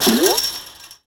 BIG Ball Barb Wire, Impact; Cars; XBOX

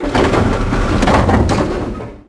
door; XBOX; Grand Theft Auto III

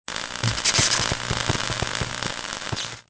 elec; Soldier Of Fortune II Double Helix; XBOX

